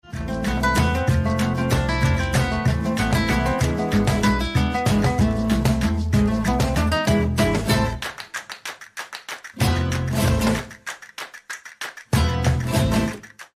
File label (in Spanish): musica tradicional espanha